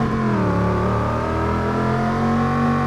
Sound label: second, vehicles; tahoe